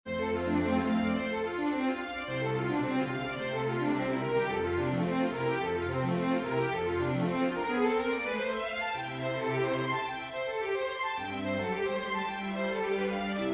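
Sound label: prelude major for string quartet; chopin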